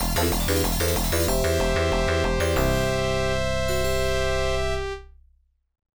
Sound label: Round III Clear; Tecmo Knight; arcade